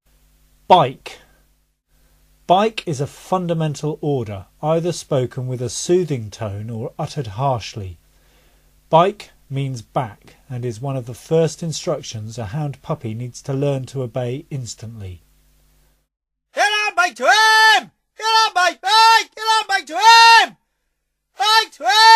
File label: BIKE, calls